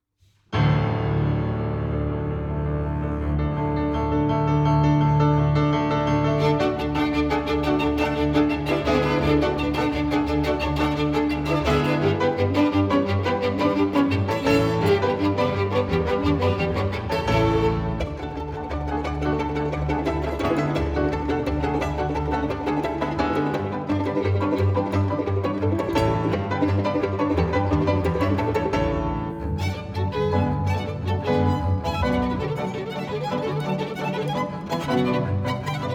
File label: Romanian Folk Dances; B. Bartok; Poarga romanesca